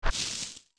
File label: steps tall grass; Walking in Tall Grass; Young Link uses 1 and 2 Adult Link uses 3 and 4; OOT